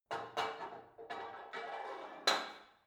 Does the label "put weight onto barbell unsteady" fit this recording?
Yes